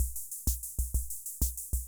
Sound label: Ace Tone Rhythm Ace Loop, Drum Machines; 1967